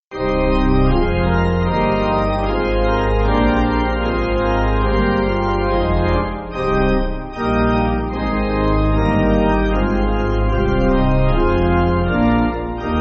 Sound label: Pipe Organ, Music Backing; I Will Trust Him; I love Jesus